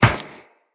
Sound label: Run Metal Perforated, Footsteps; Tenno